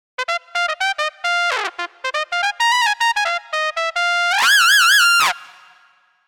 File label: Shrill, solo trumpet